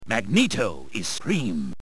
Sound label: scream; magneto